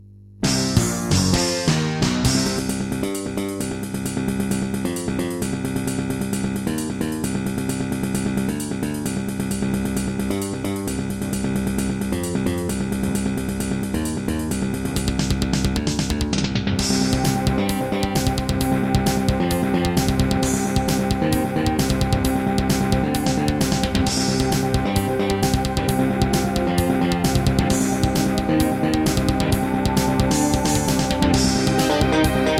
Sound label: Creative Wave Blaster II; sigil doom episode 5